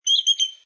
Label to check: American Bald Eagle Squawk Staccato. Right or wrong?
Right